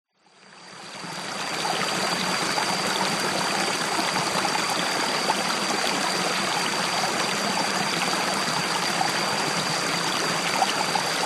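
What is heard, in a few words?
nature river jungle water flow insects background thailand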